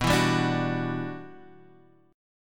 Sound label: E Minor 9th B